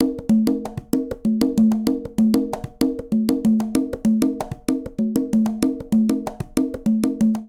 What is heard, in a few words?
Bembe 6 8 Conga Tumba 128bpm; Latin Percussion; Ableton; Factory Packs